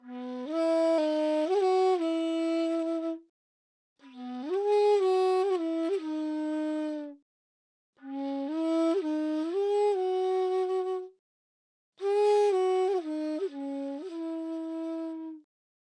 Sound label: Dancehall Chinese Flute E maj 120 BPM